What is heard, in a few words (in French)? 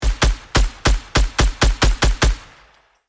scar bruit